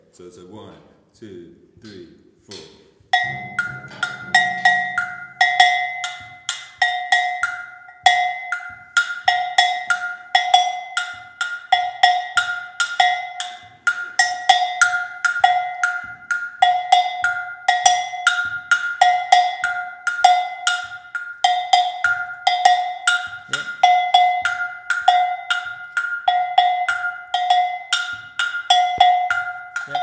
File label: funk agogo, samba